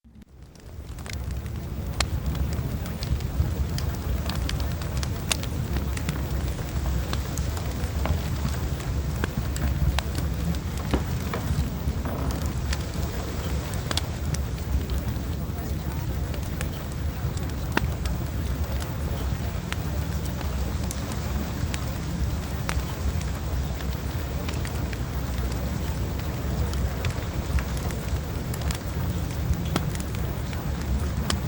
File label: Fireplace